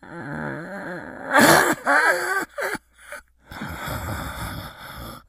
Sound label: moan, infected, idle moaning